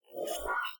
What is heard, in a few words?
popup up; yingyan